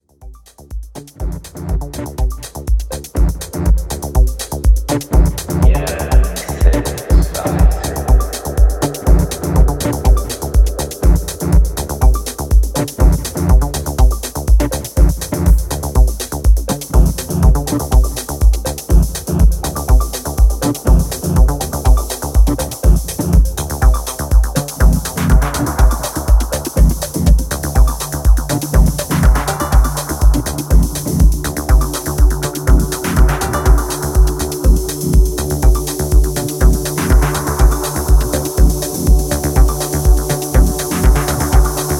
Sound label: Synthesizer